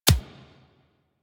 Bullet Impact Hit Notification; Warframe; Weapons

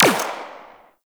Rifle Fire; Warframe; Venus; Venus Flying Nullifier

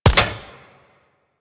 Fishing Spear Damage Hit; Warframe; Venus Fishing Spears

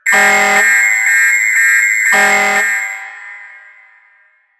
Large Vehicle Alarm Destroy; Ambience; Props; Warframe; NineteenNinetyNine

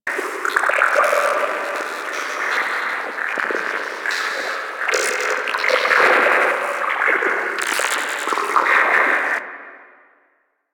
Orb Loop, ambience; Warframe; Sacrifice; Vitruvian Memory

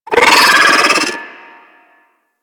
Pet Vocal Grenade; Enemies; Vocals; Warframe; Alad V Pet

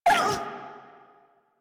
Feral Vocal Pain; Warframe; Kubrow

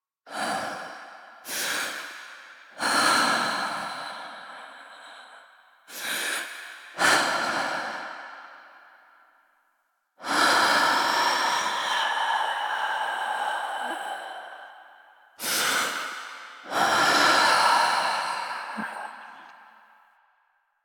Laboured Breaths; Warframe; Jade Shadows; Quests

